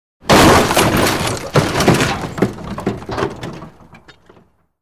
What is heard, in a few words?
Vehicle Dropped On A